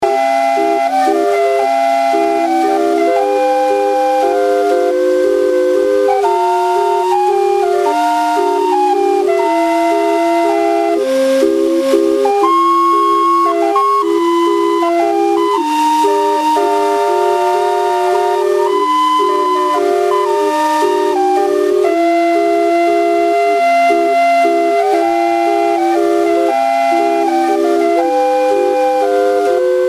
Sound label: calliope barge